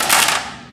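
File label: physics clipboard impact medium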